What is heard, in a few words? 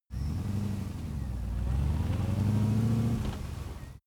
car mitsubishi pajero v8 slow start drive